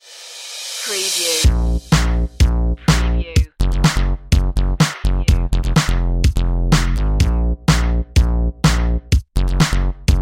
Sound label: pulsating and uplifting underscore